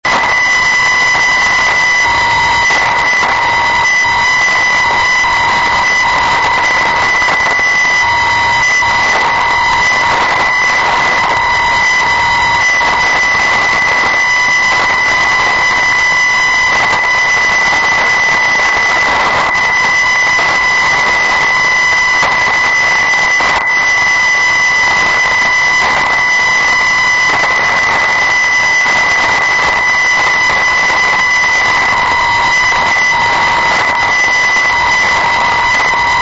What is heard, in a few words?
318khz; cp